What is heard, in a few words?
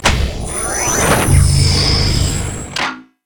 door Space Ship hatchway; Solar Sky; SoundMorph